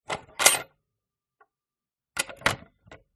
box phone handset picked up and set down roughly in cradle; 1882; Sound Ex Machina